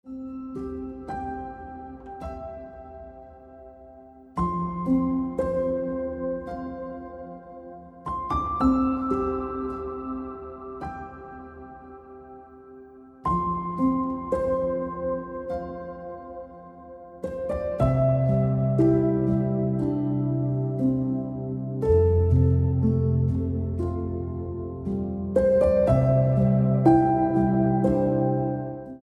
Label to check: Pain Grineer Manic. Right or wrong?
Wrong